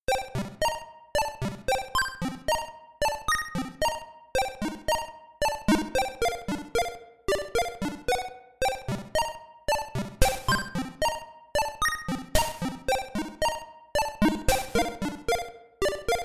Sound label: arpeggio thingy